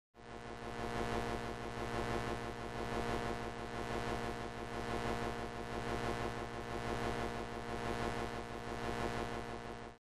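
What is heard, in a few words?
Electric Buzz Sci Fi; buzz & hums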